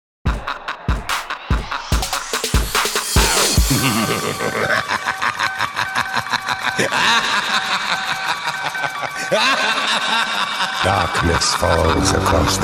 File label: HALLOWEEN 145 BPM